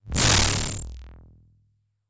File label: burn